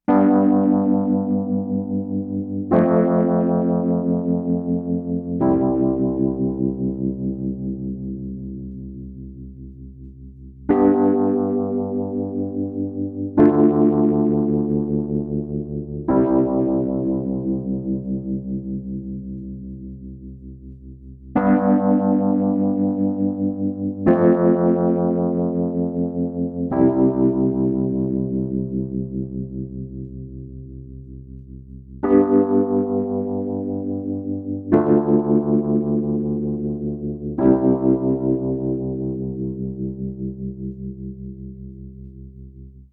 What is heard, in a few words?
Wurli; Pro Tools Essential Demos; Digidesign